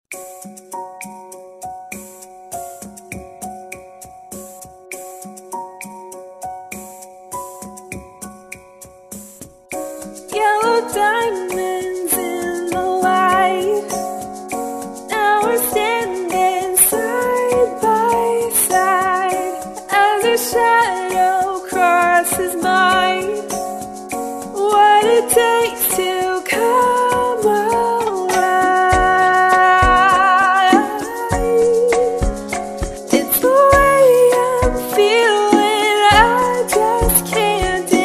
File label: Ballroom Rumba, 24-27 bpm